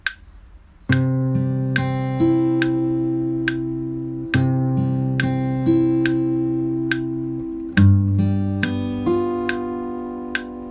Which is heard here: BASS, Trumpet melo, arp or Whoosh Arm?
arp